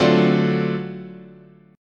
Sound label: D 7th Sharp 9th, guitar chord